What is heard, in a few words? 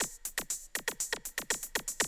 120, Classic Drum Loops